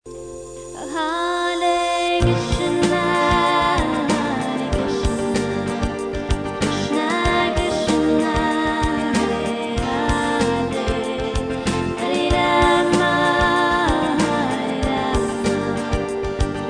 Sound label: Hare Krishna Maha Mantra